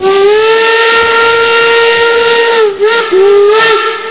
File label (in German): pfeife